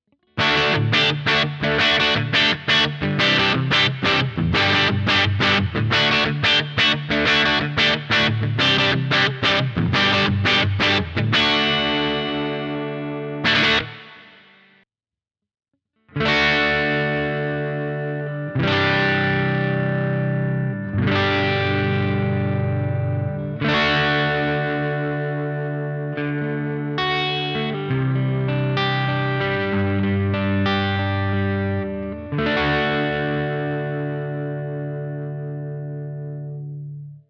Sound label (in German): Katana Treble Boost